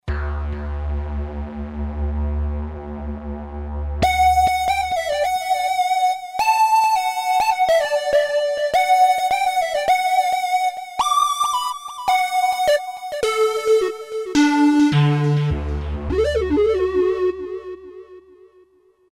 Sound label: Modular Lead, Vintage Synth; Roland SR-JV80-04